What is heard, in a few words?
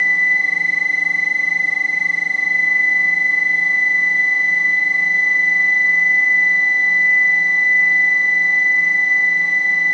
alarm